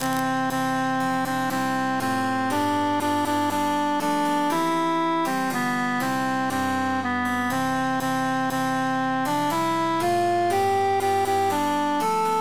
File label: altus emphasized; since first i saw